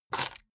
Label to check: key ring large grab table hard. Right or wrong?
Right